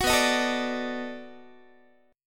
B 7th Sharp 9th, down, Guitar; B7#9; strummed

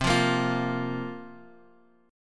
C 7th Suspended 4th

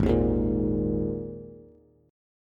Ab Suspended 2nd chord, Bass; strummed; JGuitar

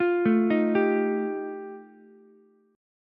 G 7th Suspended 2nd Sharp 5th G chord, Ukulele; arpeggiated; JGuitar

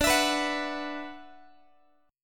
D 6th Add 9th D, Guitar; D6add9 strummed; x,22,19,21,22,x; tuning 4A,4E,5A,5D,5G#,6C#

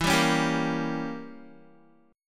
E Diminished 7th E, Guitar; Edim7; strummed

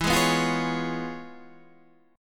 C sharp 7th, E; strummed; chord

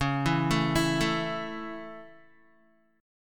Db Minor Sharp 5th Db down Guitar

